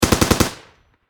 Warfare Machine Gun M60 Short Burst Close Perspective